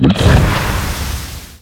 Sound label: gobble cast; weapons; dota; snapfire